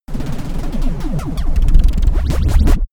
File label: space laser blaster cannon damped; krieg-waffen; soundpool